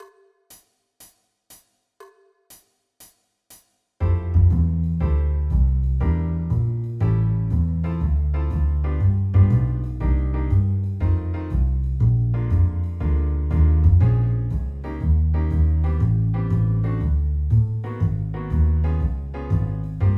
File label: drums accompaniment blues